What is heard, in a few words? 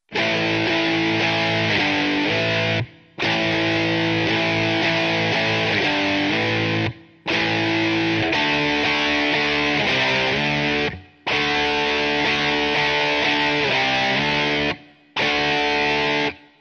Rock guitar; yao gun ji ta